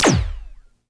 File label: Small Multi Beam; battle suit aces